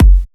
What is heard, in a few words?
Kicks; Vengeance Samples